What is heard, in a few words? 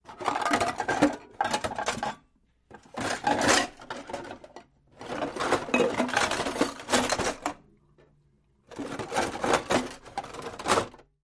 Rummaging Through, utensils